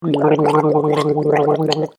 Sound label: Gurgle Man Gurgling Water; human sound effects; LYN116 package of human sound effects; Gargling Sound Effects